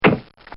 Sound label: Cartoon Sound Effects Bang; Cartoon Sound Design